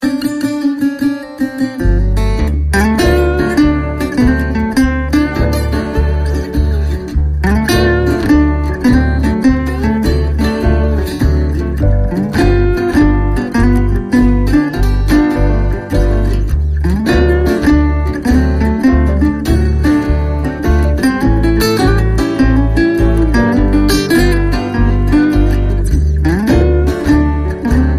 Blues, No Drums

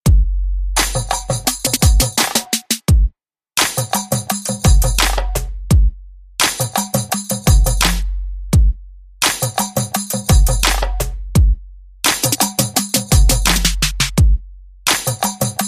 Xtreme Beat

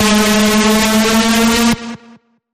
Bend, G sharp; Feel The Bend

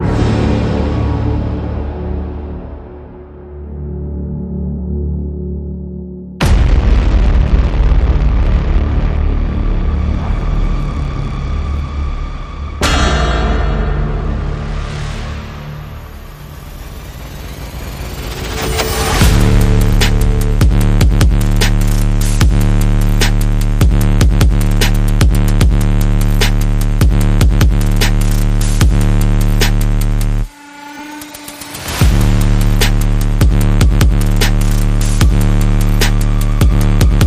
No Melody

